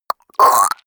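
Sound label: gag, body sounds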